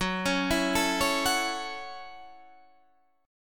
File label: A 6th Gb